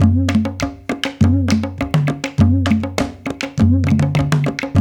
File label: Haitian Congo, World Dance; Spectrasonics - Supreme Beats